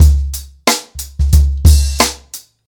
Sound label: 91 bpm drum loop sample c sharp key